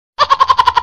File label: hehehehe